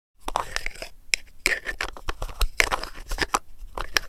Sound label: Professional camera replacing the lens